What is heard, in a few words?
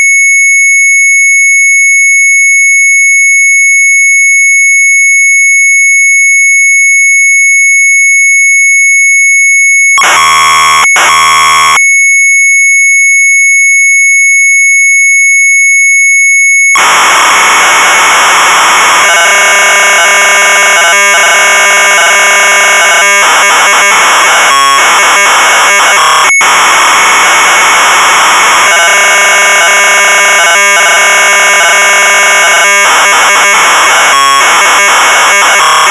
MZ800 Tape; BASIC 5025S; sharp; retromelsarchive